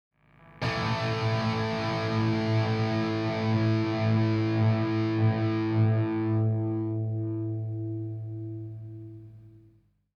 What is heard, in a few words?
power chord